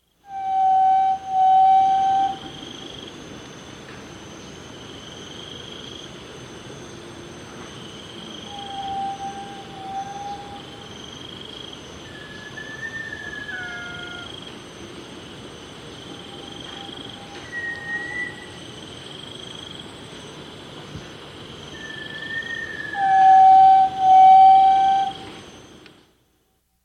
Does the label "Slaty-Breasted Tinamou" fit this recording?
Yes